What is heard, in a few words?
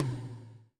Hi Big, Alesis SR16